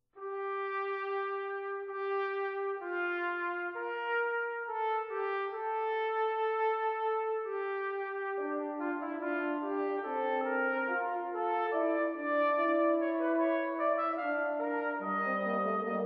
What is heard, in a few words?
Hamonic Brass; Nun kommt der Heiden Heiland